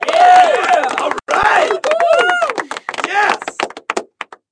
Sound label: cheering